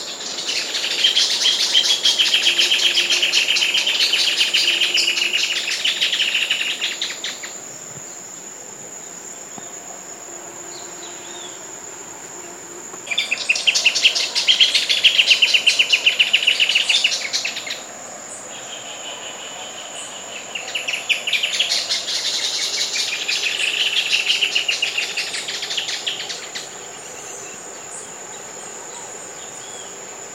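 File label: Furnarius figulus 3 individuals, golfe; I Comandatuba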